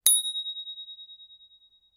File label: Ting